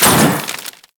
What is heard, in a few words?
Proc Heat; Warframe; Mods